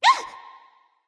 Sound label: nv surprise; smite; awilix